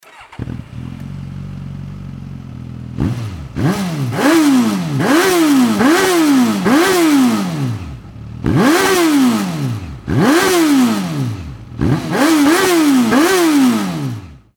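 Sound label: gsx-s1000 normal, motorcycle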